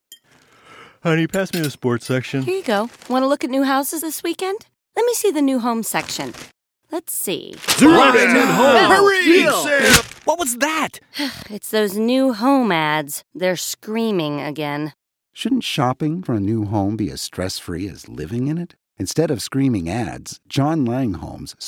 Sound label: Quiet Radio